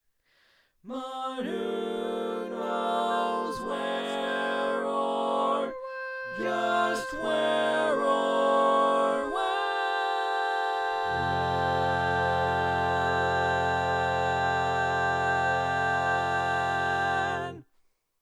barbershop tags

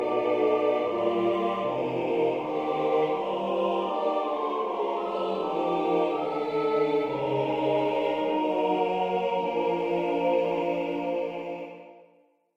All Parts, Barbershop Tags

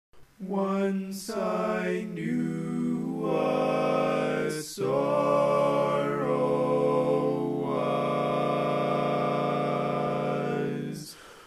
Tenor, barbershop tags

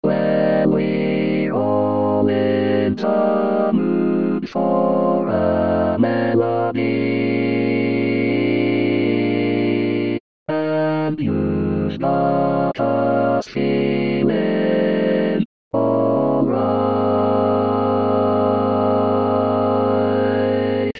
Bari, barbershop tag

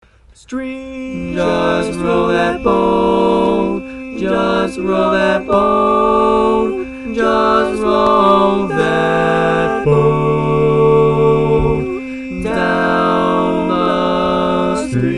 All Parts, barbershop

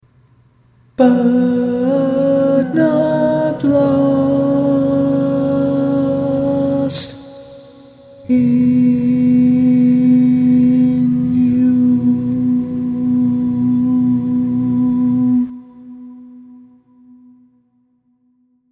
barbershop tags

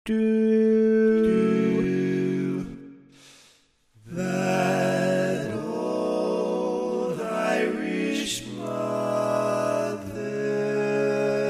Bari, barbershop

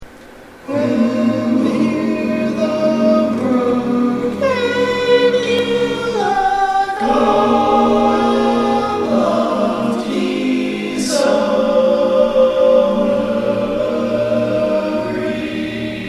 All Parts, barbershop

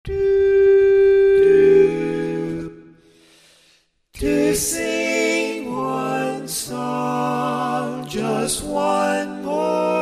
Lead, Barbershop Tags

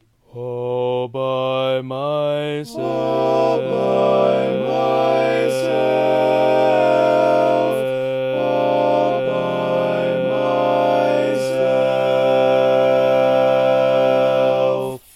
All Parts, Barbershop Tags

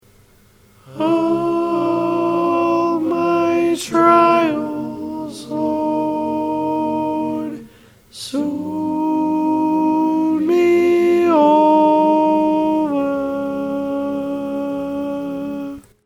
Tenor

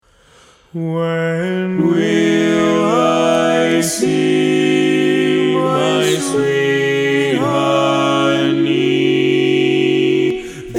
barbershop tags, all parts

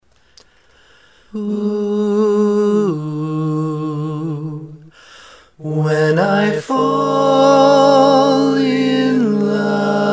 simple All Parts, barbershop

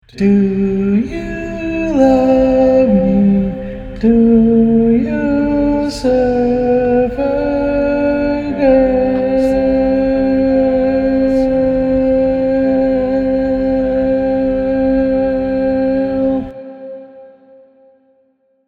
Lead; Each recording below is part predominant - one part louder, other parts quieter.

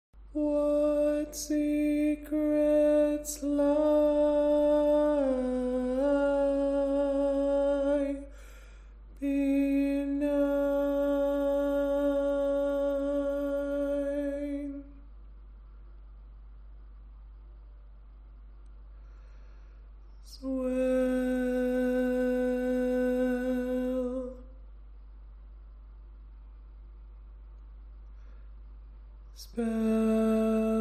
barbershop tags

